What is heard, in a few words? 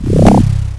Error; Realmz 2.0.4